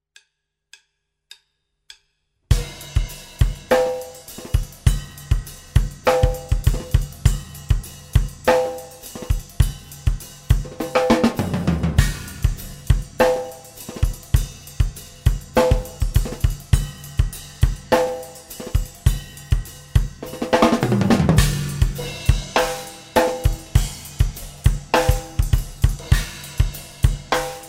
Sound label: solo peace